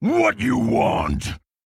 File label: Grunt; Frostwolf